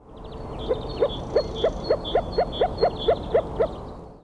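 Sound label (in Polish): rana arvalis, plazy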